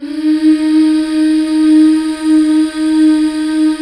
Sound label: MMHH D#4; Best Service Gigapack 1